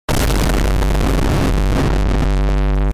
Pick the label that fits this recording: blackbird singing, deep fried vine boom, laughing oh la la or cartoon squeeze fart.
deep fried vine boom